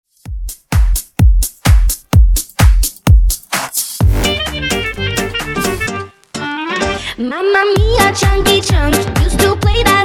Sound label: Redrum